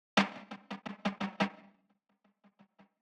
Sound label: SNARE ROLL